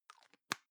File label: plastic can lid screw close soft; objekte; dose